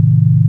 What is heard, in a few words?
sine; ものづくり基礎工学（情報工学分野）; 音の波形と分析